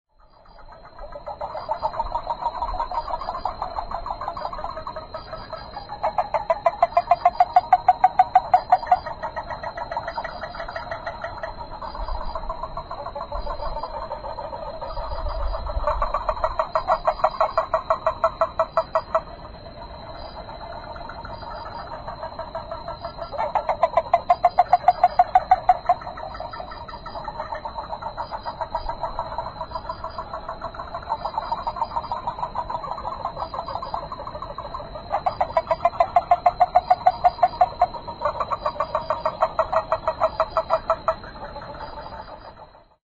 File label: yaeyamaharabutigaeru call, frog